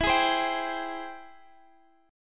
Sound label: G sharp Augmented Major 7th, guitar